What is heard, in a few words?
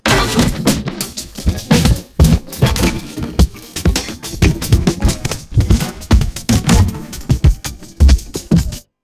breaks